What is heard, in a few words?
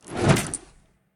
armor; god ares; smite; ares v4 skin16